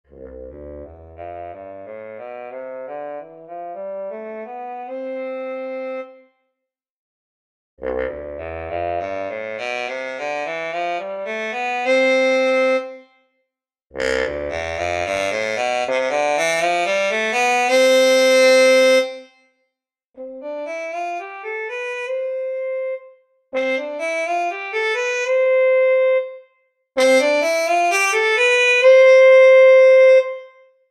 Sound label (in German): Tenorposaune Cup